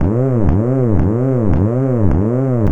SLOW MACH, Anime Sound FX; COMIC

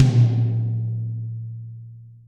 TOMS, Real Mega Drums

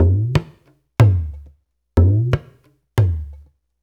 Dara Dance, Darbuka; Spectrasonics; Supreme Beats; World Dance

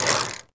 Piano Version; Otogirisou

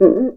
CUICA; ILIO

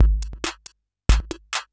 percussion loops, 138, Techno

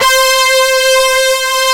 RR BRASS

